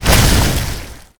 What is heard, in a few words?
Toss Waterball; Nicktoons Nitro; arcade